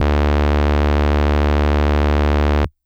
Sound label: Hard Brass DX100 D2